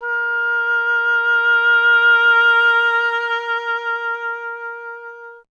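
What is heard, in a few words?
Wind OBOE A; Miroslav Vitous - Solo Instruments VOL-2; Solo lnst. II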